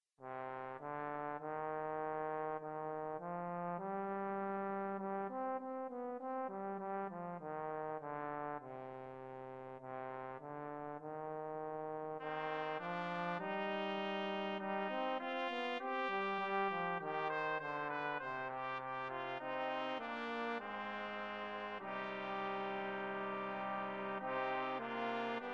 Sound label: Brass 4, brass quartet